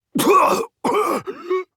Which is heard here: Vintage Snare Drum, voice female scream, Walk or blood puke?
blood puke